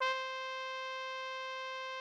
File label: Instrument